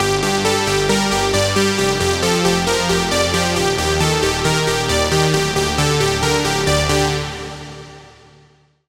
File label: Pluck; Routed Sound; Serum 2 Expansion - Reality Maker Vol.1